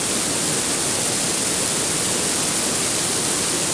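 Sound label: waterfall small loop; water splashes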